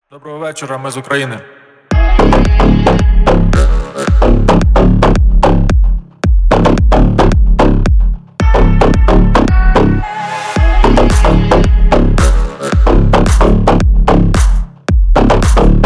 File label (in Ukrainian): Рингтон